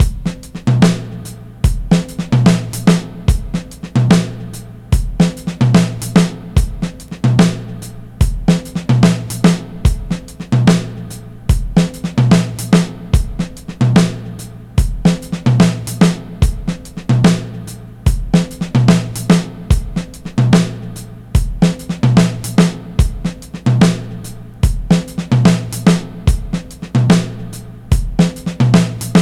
SNAPPY TOM, 73 bpm, Breaks; POTBreaks

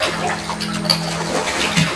WET, WATER; AKAI S6000 CD-ROM - Volume 1

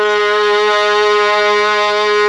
STR, STRING3+OBOE, M400; ILIO; Propeller Island Legendary

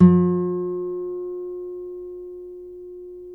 NYLON NO VIB G 3C, Guitars; Spectrasonics; Hans Zimmer Guitars VOL-1

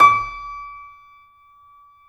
C7 F, The Ultimate Piano Collection; East West

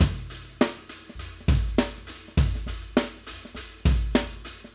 Acoustic Live Drum; Platinum Audiolab

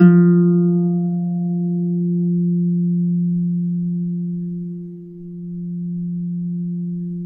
F2 HARP; USB Soundscan vol.62; AKAI

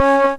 WOODWINDS

